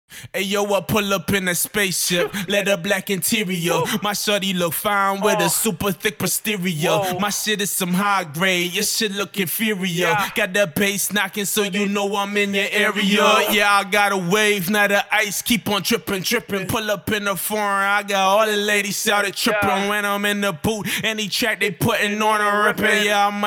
HH 152 Am

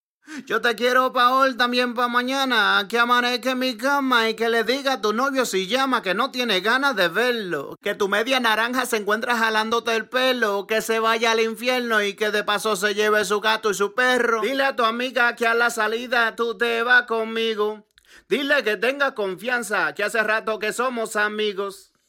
trap 120 Gbm, Acapellas

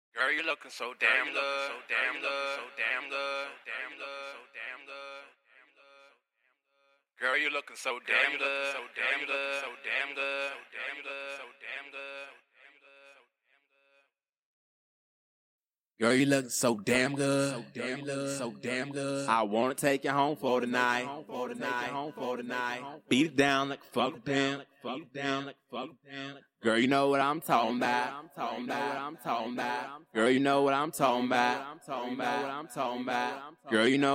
hip hop 68 Db, Acapellas

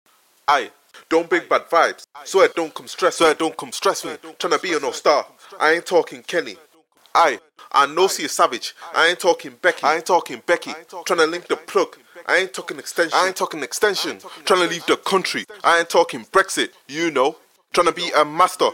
Drill Acapella grime 144 Abm

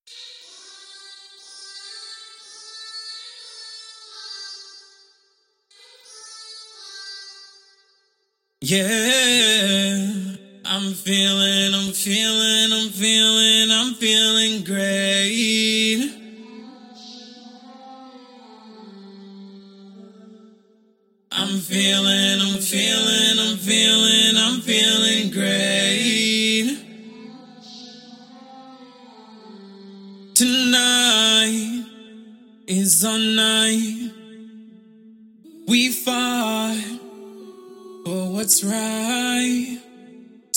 pop, 90, D, acapellas

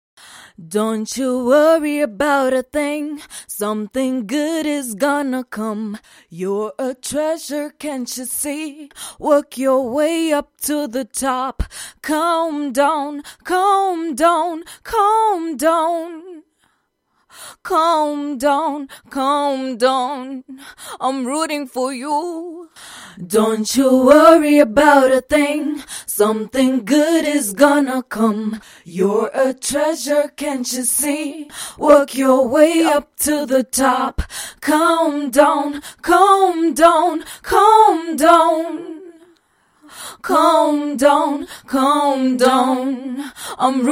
pop rnb african Am 84

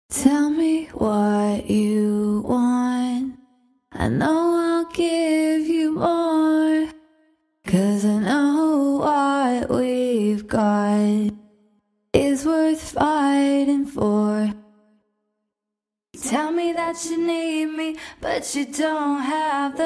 dance pop 120 Abm

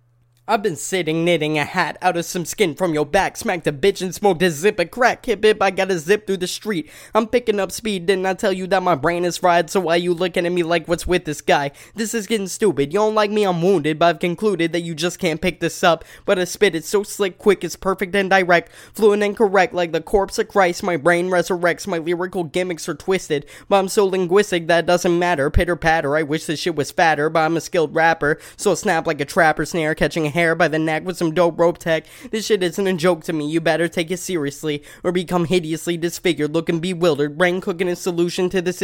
BPM152 Key Fm

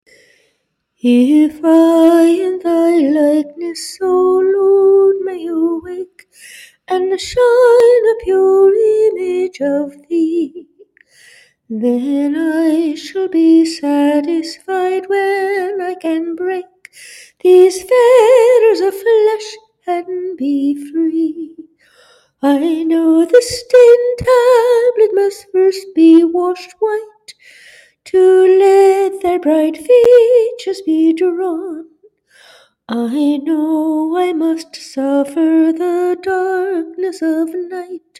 folk 130 Gb, Acapellas

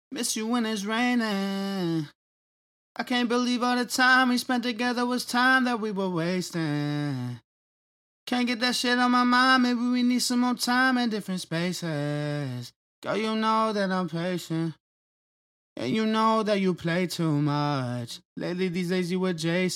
rnb pop 89 GM

